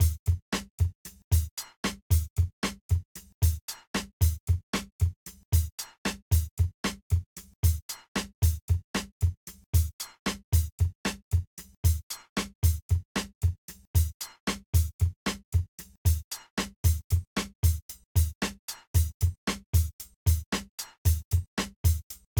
bateria

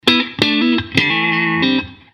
Riff pulsado

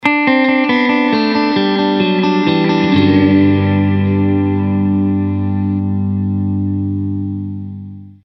Frase melo

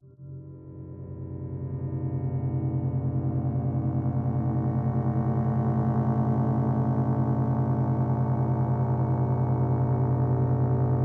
Loops mutantes

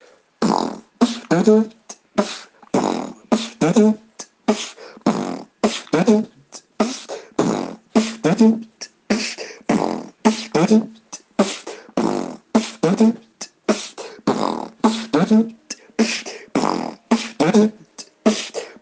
himno beat

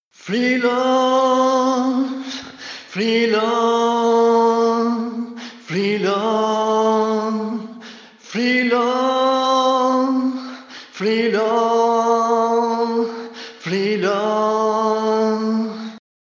coro voz

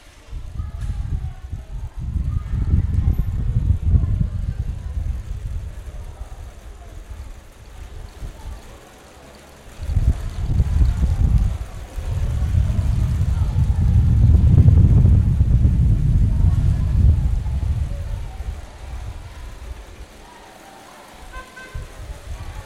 Gotas

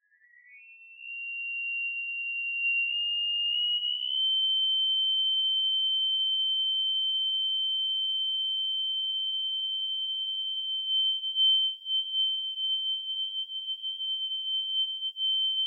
Loops mutantes

